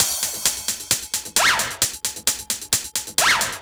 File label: 132 Hats, Drum Loops; Zero-G; Soundclash Nu Dance Electro; Acidized Wavs; 132bpm; Bump n Groove